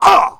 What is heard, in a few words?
Male Hurt; Mars Sortie